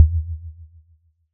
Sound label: BD sub